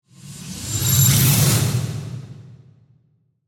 Whoosh Metallic, Ring, Flange, Dark, Accent, Transition; Production Element, Imaging Element; Whoosh Sound Effects